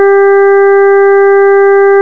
TONE RAN

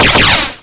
BLASTER; HIGHTECH

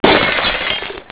GLASS

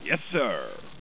HEAVY; LEDWARS

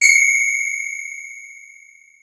BING

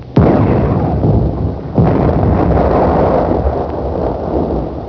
CANNON

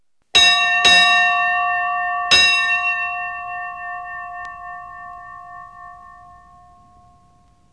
SHIPBELL

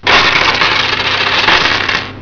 COINS

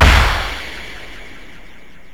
SHOT

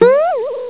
PINGER; HIGHTECH

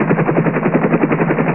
heli idle; WalkerII

